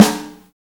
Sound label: normal hit clap; osu